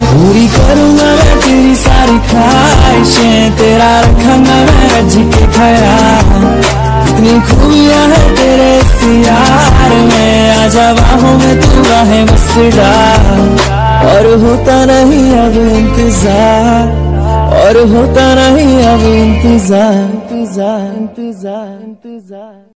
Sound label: hindi music ringtone, bollywood